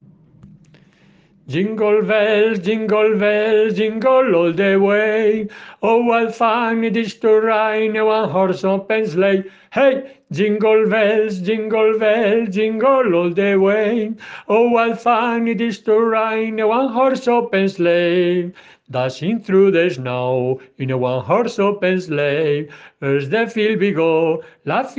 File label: jingle bells